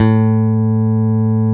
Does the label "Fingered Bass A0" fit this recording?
Yes